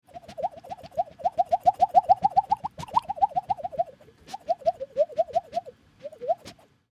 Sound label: Creature Fantasy Fly Flying; Monsters; Horror; Big Room Sound